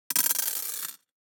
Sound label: DICE on Glass, Throw and Roll, Mini, 6 Six Dice; Samples; ArticulatedSounds; DICE Sfx Party (Extended)